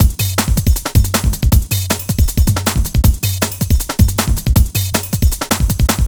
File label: Drum Loops, Jungle, 158; Combination B